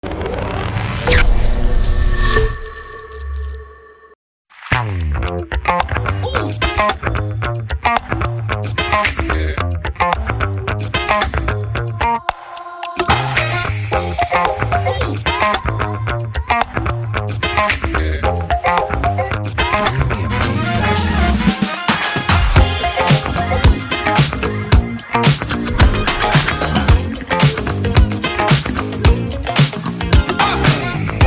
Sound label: bg music